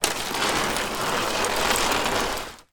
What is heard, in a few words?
sport pop up plastic framed goal small slide on hard surface; zapsplat